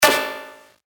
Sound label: D Carbon, percussion; revealed percussion vol 2